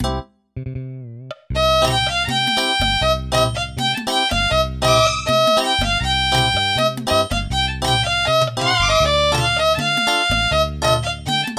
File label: arabic